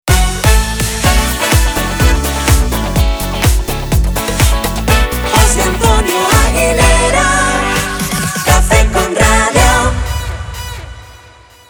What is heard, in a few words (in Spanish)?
Vocal